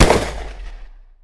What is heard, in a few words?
vepr12 fire; weapons